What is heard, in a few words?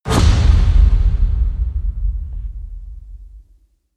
hit low flip strike